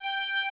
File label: VI Q MP G4; AKAI Advance Orchestra Upgrade 97 Vol.1; VI QRT MP